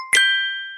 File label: samsung pay